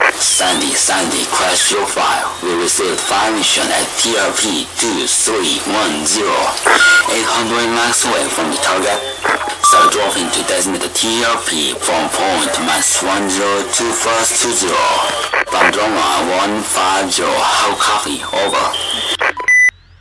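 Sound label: Military Radio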